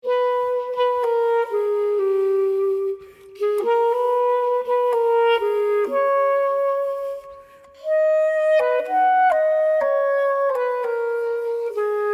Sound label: HYBRID KING WOODWINDS; ALBOOM